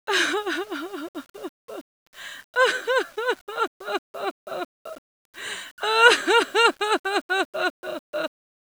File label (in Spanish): llanto; sanatorium